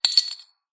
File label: heavy coin drop